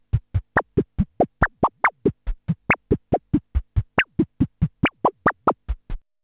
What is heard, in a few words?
BEAT, RHYTHM; AMG - Vince Clarke Lucky Bastard